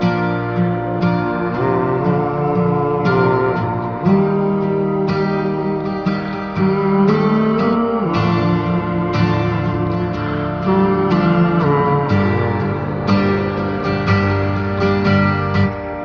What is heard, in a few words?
guitar vocal sadness; Loops